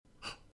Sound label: sharp inhale